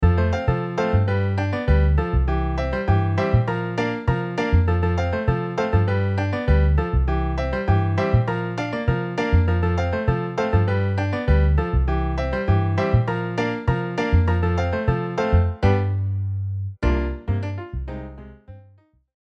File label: Piano Bass MIDI